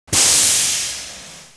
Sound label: steam